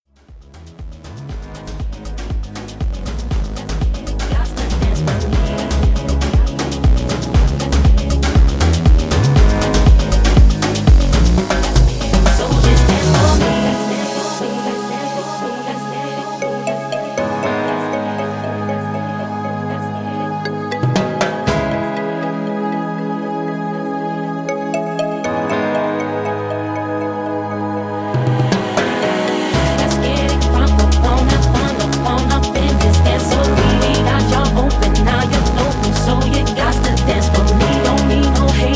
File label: Afro House; Remixfreakz